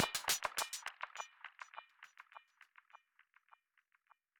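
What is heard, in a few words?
music endloop percussion; music ftue endloop percus 08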